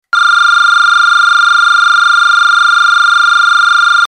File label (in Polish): ton